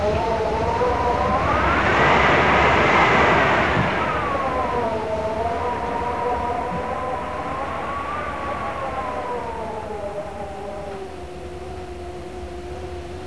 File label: storm